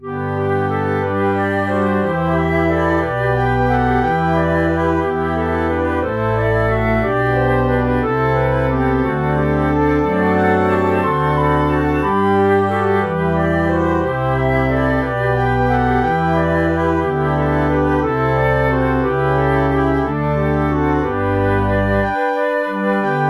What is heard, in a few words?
flute